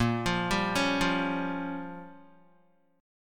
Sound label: A sharp Minor 6th A sharp down Guitar, chord; A#m6 arpeggiated